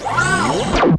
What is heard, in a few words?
ATTACK